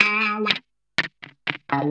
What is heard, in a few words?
125 g#, guitar licks; Vocal House; PS-28 Vocal House Samples; instrumentloops